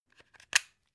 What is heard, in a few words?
plug unplug clatter